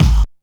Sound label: PLONK; Back In Time Records - Big Bang